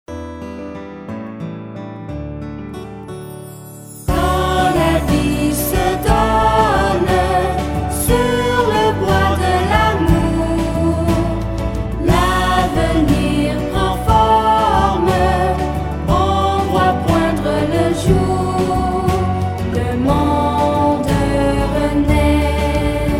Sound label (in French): chant; careme 2008; 3e dimanche; 3e semaine